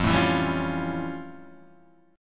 F Augmented Major 9th F Guitar Drop D

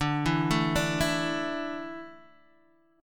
D Suspended 2nd D, down, Guitar DADGAD

